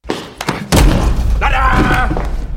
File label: tada; joker